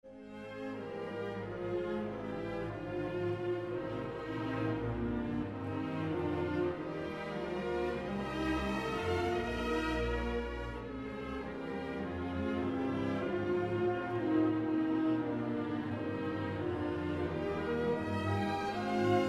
The third idea, an open scale going downwards and upwards, played by the violas and the bassoons; Schumann symph3; Movement n.3 - excerpt n.3